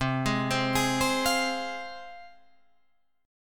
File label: C Suspended 4th Sharp 5th, Guitar, Drop D; down; chord shape